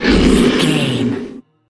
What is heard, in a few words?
troll monster breath